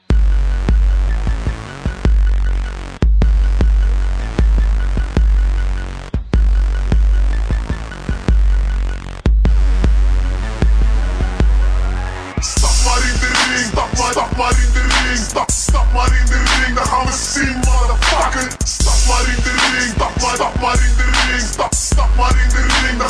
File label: Bass